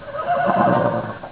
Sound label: horse